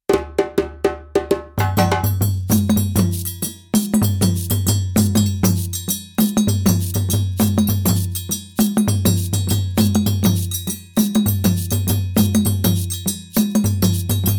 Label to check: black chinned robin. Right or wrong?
Wrong